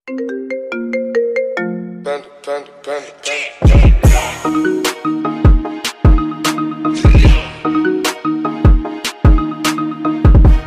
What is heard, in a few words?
iphone panda, Ringing Tones